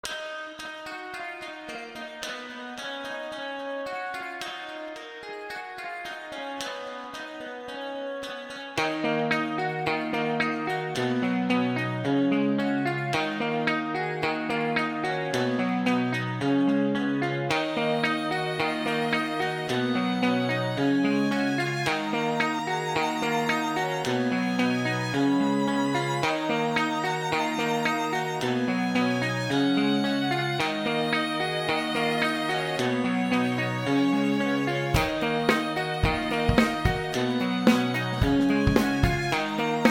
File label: guitar